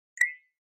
Flash Beep HF String P, flash beep

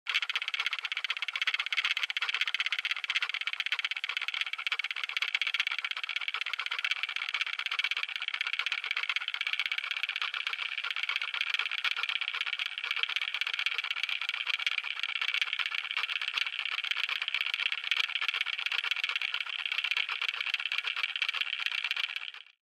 Clock Int Mechanics, gears

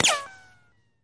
Bullet Ricochet Sin

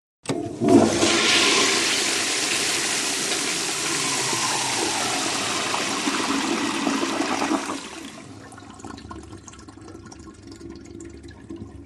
Low Tank Toilet Flush

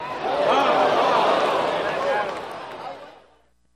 Crowd Cheer Good Shot; ohhs

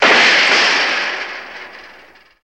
Metal Fence Crash; fences

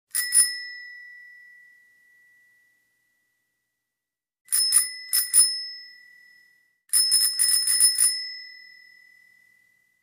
Bicycle Bell Rings

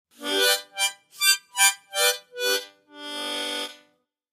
Mouth Organ Up Down, harmonica; woodwinds

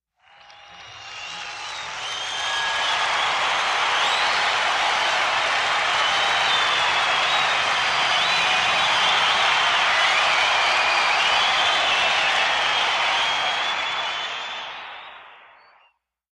Huge Concert Crowd Su

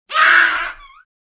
Baboon Scream Short

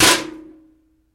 Ac Duct Rattle Noise

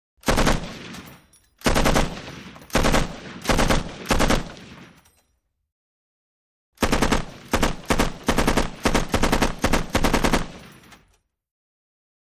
M60 Bursts Distant

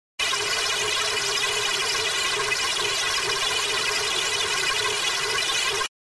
Distort Elect Drone, distortion

